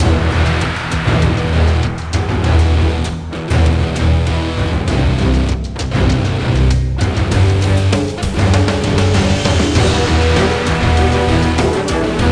Environment Music

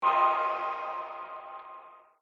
Combat Sting End

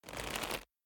Leather Foley; Devour; The Town

